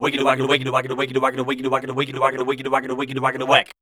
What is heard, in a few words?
SCAT C, Voice